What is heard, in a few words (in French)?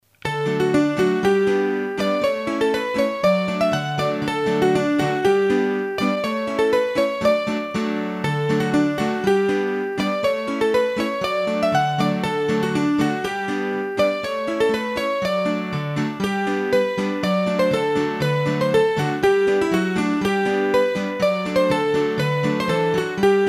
Bourree de la Brande d'Ardentes, hurdy gurdy; Bourrees a 2 Temp